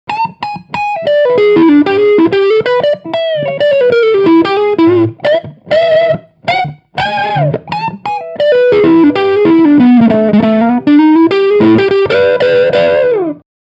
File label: lick crunchy